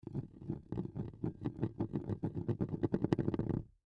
Glass Cup Roll, Glass Cup Roll Wood Table Wobble; Dishware; Household; Blastwave

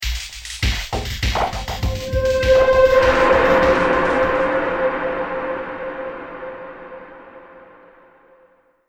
Radio Stinger

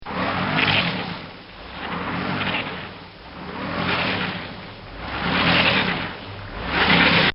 Car Stuck In The Mud; Vehicles

